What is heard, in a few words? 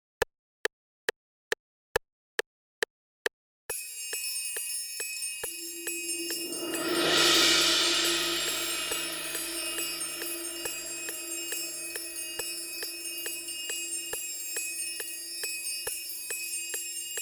with click